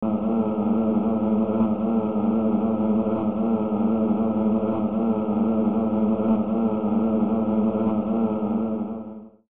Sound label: Buzzing In Your Ears Wasp Ambience; The Legend of Mata Nui; Game Music; Console Games